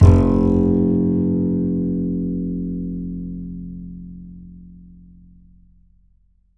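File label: double bass F2, Jazzistic; Univers Sons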